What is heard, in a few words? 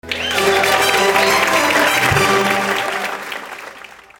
fanfare